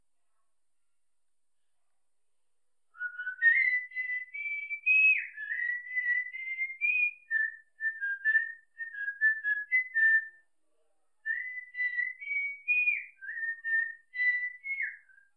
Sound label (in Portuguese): Assobio para Calopsita